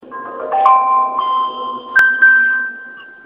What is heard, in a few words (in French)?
sncf musique tgv